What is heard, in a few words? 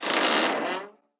Cauldron Tip